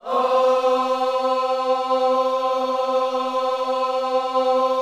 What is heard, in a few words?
OHS C 4, gospel; Spectrasonics; Vocal Planet Vol.1 Gospel; akai